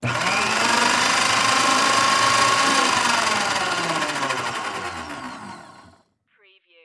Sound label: Paper Shredder Shred